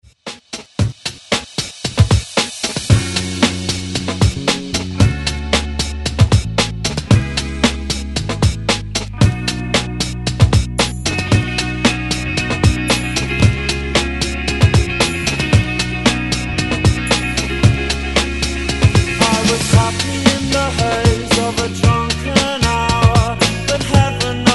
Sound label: 80s ReDrum 114 Bpm; DJDOLLS